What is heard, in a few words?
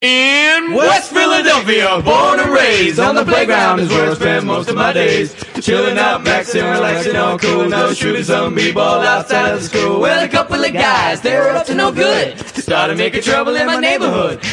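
rap, ringtone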